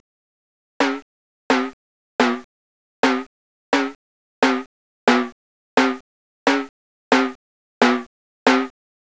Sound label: rain drops